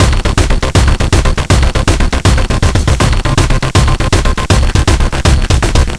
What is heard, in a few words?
Beats Loop; Anger Foot Demo; Angerfoot Beats 03